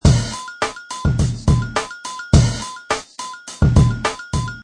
FNKY DR, Funky drum loop 105 bpm.; Drum Loops (Royalty Free Music Loops:Music Production Loops)

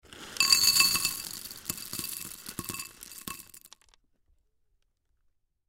Household Cereal Pour Into Bowl Glass Table Food; Blastwave

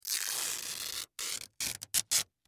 Scotch Tape Peel, Scotch Tape Peel Piece; Foley; Offices; Blastwave

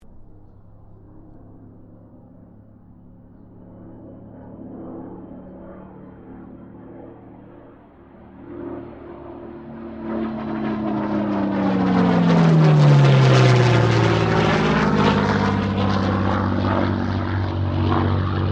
AIRCRAFT SUPERMARINE SPITFIRE MK-XVI PASS; Airplane; Airplanes; Vehicles

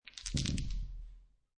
Dice Throw Five Dice On Felt; Casinos

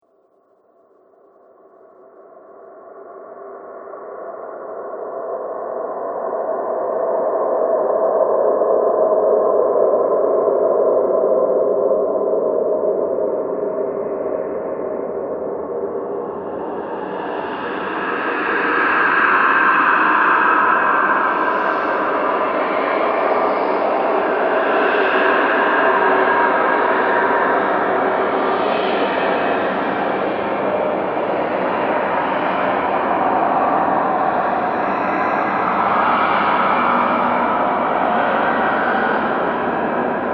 Dark Evil Torment, Scary Soundscape

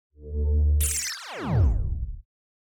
Laser Whoosh Front To Rear To Front; Laser Sound; Production Element Imaging Element Accent Transition; Blastwave

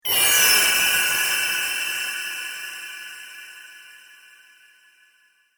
Eerie Chimes Scary Suspense Dark Metallic; Horror; Scary Tones; BBFX

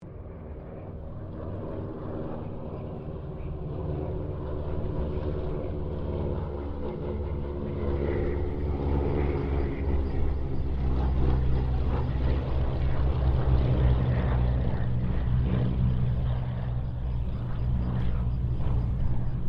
C195 squadron 2 pass, Airplane; Mixed aircraft squadrons

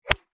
Golf Swing, Sports, Golf, Swing, 7, Iron; Nike, 3, Iron

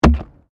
PLASTIC RUBBER PIPE; Material components for constructing more complex sounds; Plastic (Sound Effects:Materials)

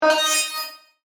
Multimedia Chime, Technology, Multimedia, Chime

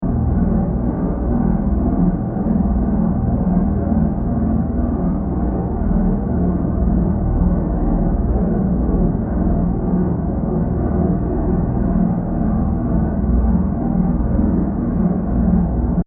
Dark Generator Ambience 120bpm; Scary Soundscapes; Horror; Sound Effects:Horror; CraftedSignals

